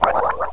gurp

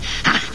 jump; vegeta

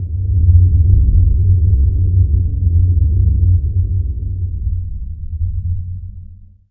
machine; spirit2dm6

